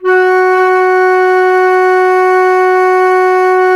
FLT, WOODWINDS; Roland - LA Composer vol. 1

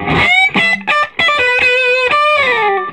SOLO, Vintage Blues Guitar

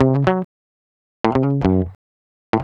Guitar Licks

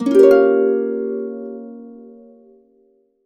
HC GDIM7, Complete Harps; USB Soundscan vol.62; AKAI

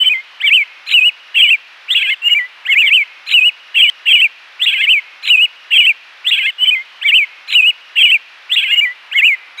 The Birds; Sonic Foundry (Sony Creative Software); Club Joints and Street Anthems

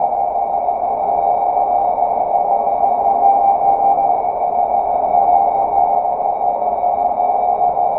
Drone Ambient; Drums & Drones

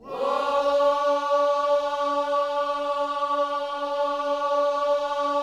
WHOA, Gospel; Spectrasonics Vocal Planet

